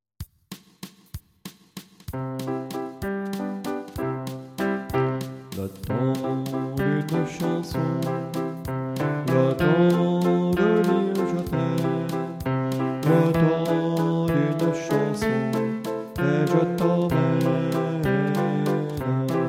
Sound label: alto